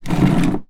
household chair plastic outdoor scrape concrete floor; sound effects one